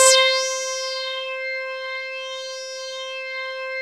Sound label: JV SYNTHACON; JV-1080; VINTAGE-EXP